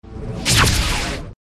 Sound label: Light Spirit